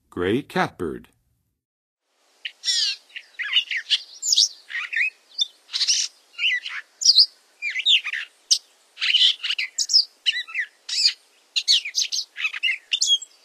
Gray Catbird; North America; Western USA; Western USA Songbirds

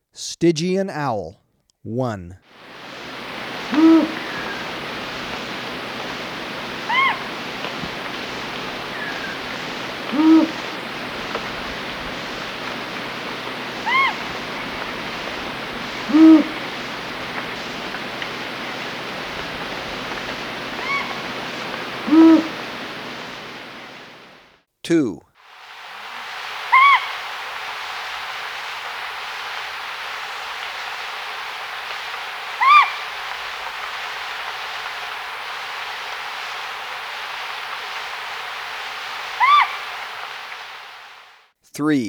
Stygian Owl, Birds of Ecuador; S. America

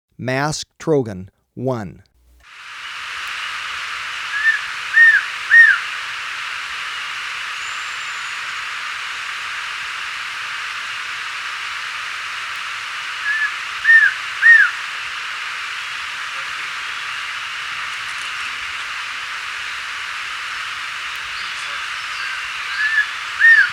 Masked Trogon, Birds of Ecuador; S. America

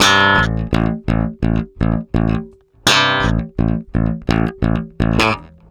Bass A Funk

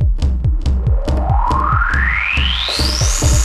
techno 140

